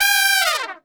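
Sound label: G3 trumpet, Brass Super Section; Best Service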